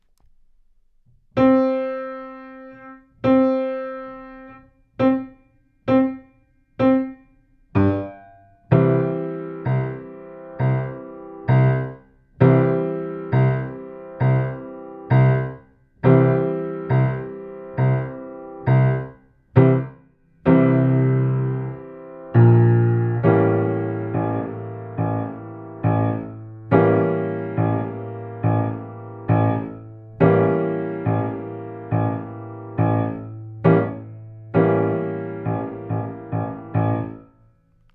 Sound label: C 8 bars, sax